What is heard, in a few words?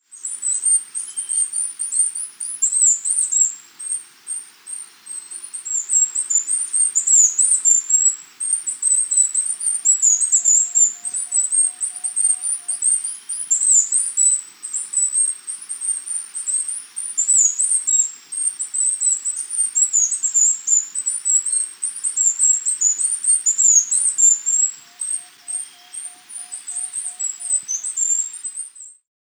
Olive Finch (Lysurus Castaneiceps); S. America